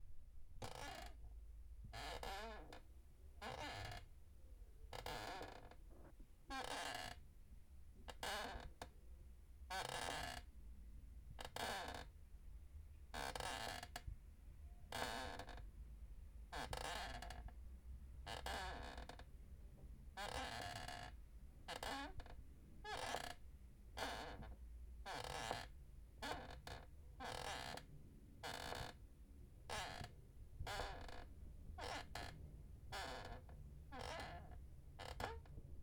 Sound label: Swinging Chair